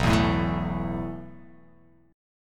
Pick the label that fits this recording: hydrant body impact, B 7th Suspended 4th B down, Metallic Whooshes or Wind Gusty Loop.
B 7th Suspended 4th B down